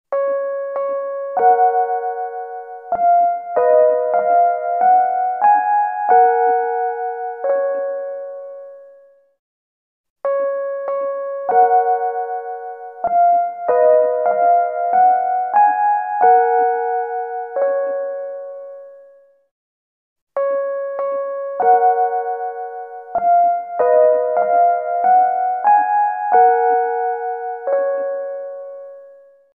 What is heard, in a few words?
Signature tune; Radio Moscow